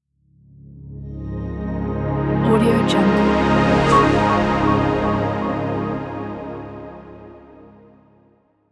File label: clean logo intro